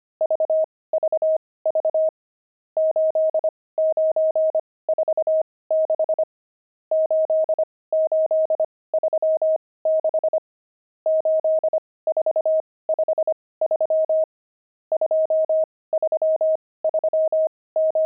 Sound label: pangram, intermediate pangrams files